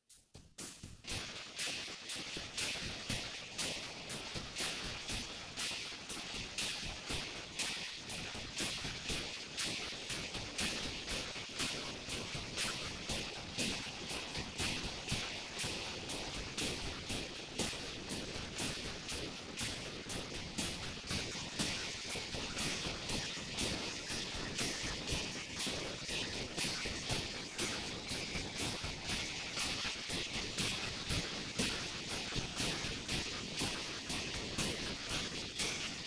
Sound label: ROCK RIFF Residual Noise